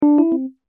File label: notification